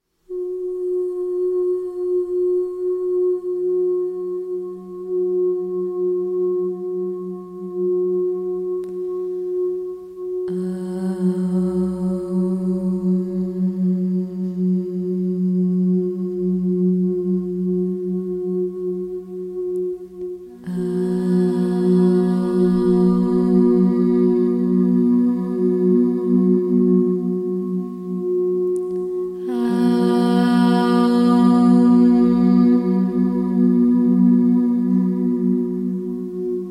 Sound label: Aum crystal chanting